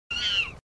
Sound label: boosted animal sound; imaqtpie